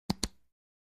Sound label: Water Bottle Lid Ope